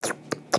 Kitty Cat Licking; creatures organic; Backpack

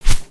Seagull Wing; creatures organic

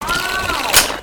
Spider Boss Fire Rain; creatures mechanical

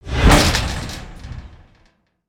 baby spider caged, creatures mechanical

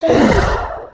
idle, creatures organic

